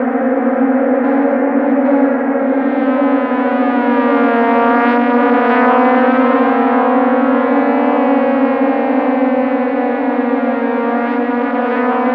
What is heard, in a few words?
synth sfx; Chillout (ambient1&2)